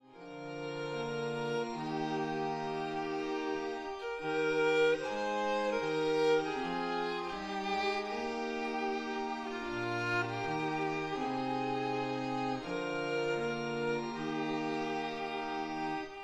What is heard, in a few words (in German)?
Violine Orgel; So nimm denn meine Haende